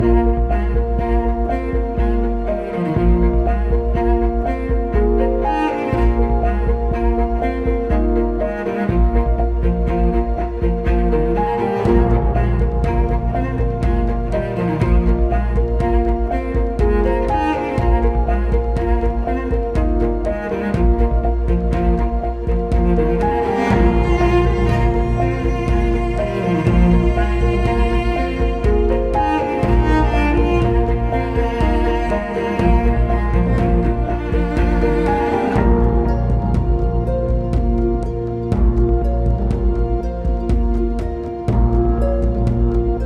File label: Music Autumn Amb Day